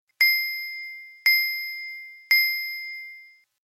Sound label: Notification Bill